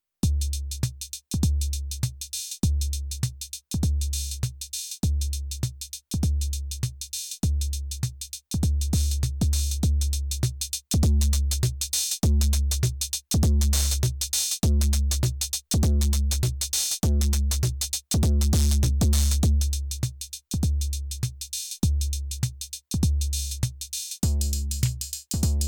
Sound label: drumbrute impact